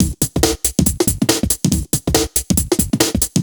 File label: Breakbeat 140 BPM